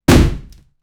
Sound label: a man crashes into a, falling